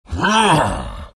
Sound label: exert; dota; beastmaster